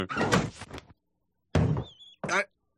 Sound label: window open; Steamed Hams, but It's a Twine Game